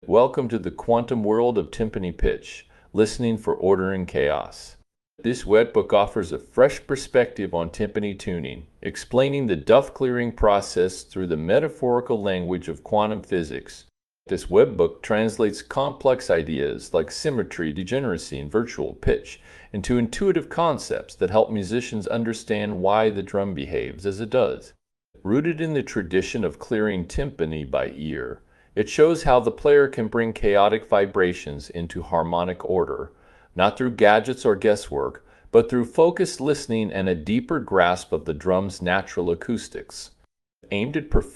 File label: timpani pitch